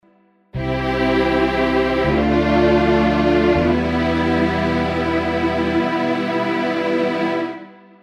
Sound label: Jingle